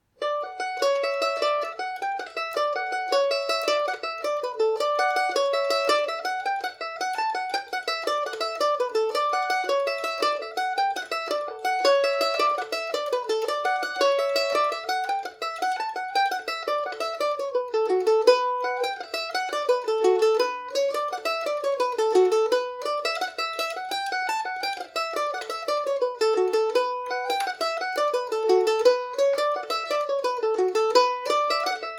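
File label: D major, irish mandolin; Paddy O’Rafferty; Kentucky KM1000 mandolin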